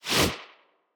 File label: Whoosh; portal quest android; Shield Minion Attack